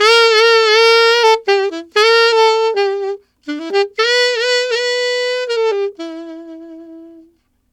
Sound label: Alto Rock in E maj, Sax; Sonic Foundry (Sony Creative Software)